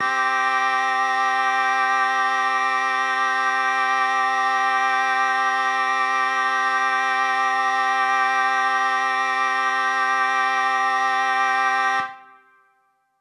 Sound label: Mixed Winds C7:9, synth orchestra